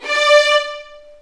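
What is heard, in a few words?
D5 violins; The Ultimate String Collection; East West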